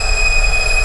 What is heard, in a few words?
G#5, ANGELIC STR, Strings; AKAI S6000 CD-ROM - Volume 1